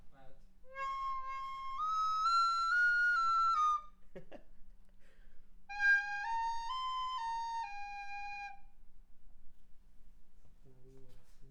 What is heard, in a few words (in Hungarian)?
furulya